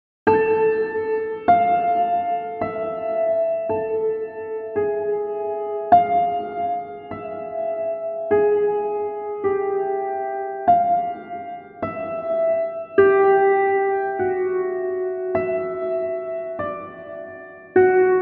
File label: Deep Breath